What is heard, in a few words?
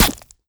bullethit player